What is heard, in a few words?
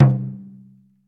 mid tom drum one shot c key, Royality free tome drum sample tuned to the C note.